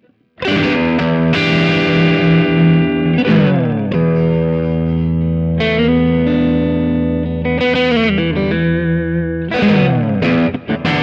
AZS2200 Prestige Neck to Bridge Alternate Switch down Crunch; Ibanez